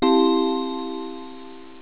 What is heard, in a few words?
gong